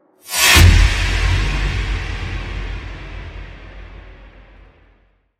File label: club impacts sound